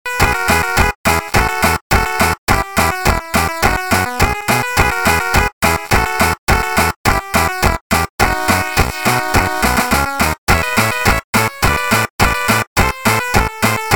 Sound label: SID, Chiptune